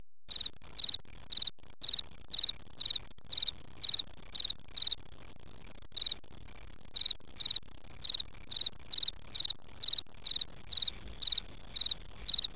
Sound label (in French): insectes